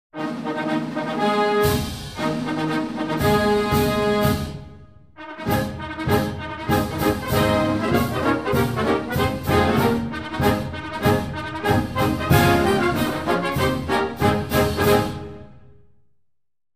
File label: Flag March; navy music